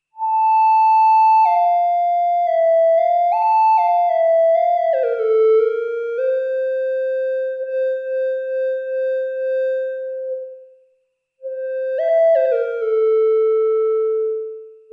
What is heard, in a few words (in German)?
moog theremini frolicking mit pc ryukyu